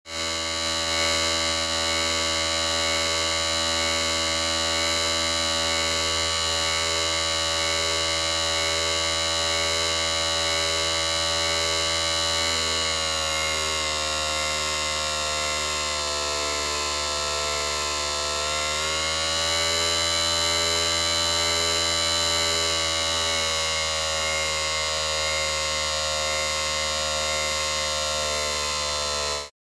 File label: Drill On Run Steady, servos